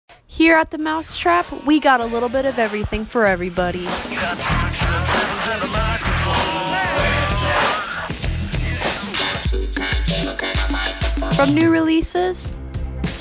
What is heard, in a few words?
Music Mashup